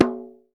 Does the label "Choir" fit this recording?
No